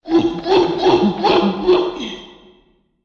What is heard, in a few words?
Gorilla